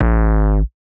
Percussive Elements; 99Sounds; The Weird Side Samples

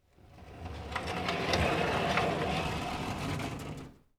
Shutter Door; 99Sounds; The Warehouse

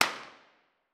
Wooden Plank Snap; 99Sounds; The Warehouse

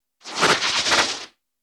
Bullet Time; FXHome; Bullet and Gun

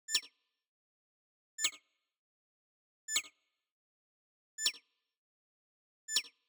UI Back; Kpow Sounds; UI SOUNDPACKS; UI SoundPack8

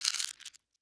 Tech, Weird Side Samples

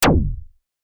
Futuristic Gun Sound; FXHome; Bullet and Gun

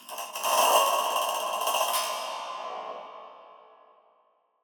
Texture Thunder Drum; MatiasMacSD; FEEL TEXTURES VOL 1

